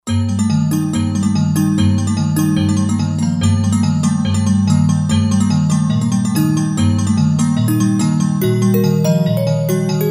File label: Gamelan, Roland JD-990; Factory Patches